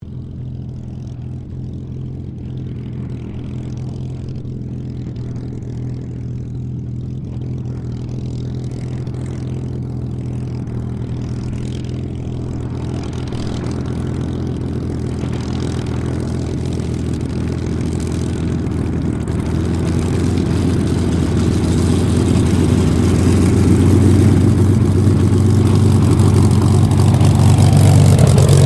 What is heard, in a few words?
AIRCRAFT SUPERMARINE SPITFIRE MK-XVI TAXI SHUTDOWN; Airplanes; Vehicles; Airplane Sound Effects